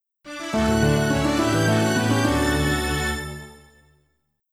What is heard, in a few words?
Calm Message; Wii Room (Shopping Update) Soundtrack